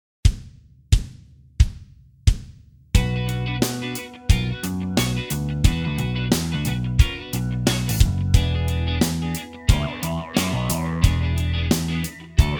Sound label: back disco funk